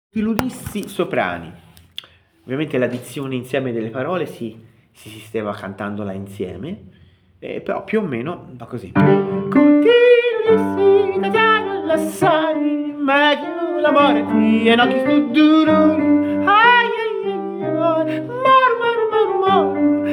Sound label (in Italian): soprani